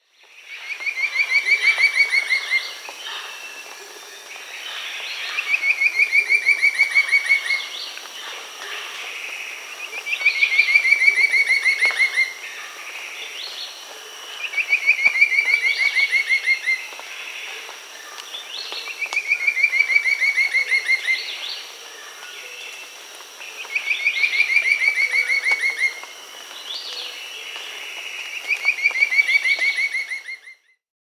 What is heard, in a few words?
Red-billed Scythebill (Campylorhamphus trochilirostris), Bolivia, Birds of the Amazon; S. America